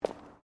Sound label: FOOTSTEPS WOMENS LOW HEELS CONCRETE STEP; Footstep Foley; Human